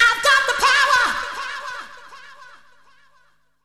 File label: vox